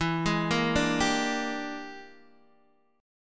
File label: E 7th Sharp 9th Flat 5th Guitar Open D, chord